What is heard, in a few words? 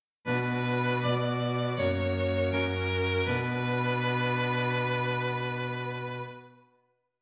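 string quartet, dictation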